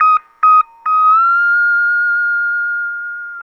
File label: Lap Steel; Trip-Hop